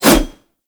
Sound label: Heavy Whoosh, Dual Daggers; Prime Okina